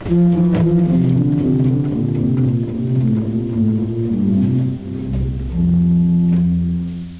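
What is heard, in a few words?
Oktavbass 8, organ; Martin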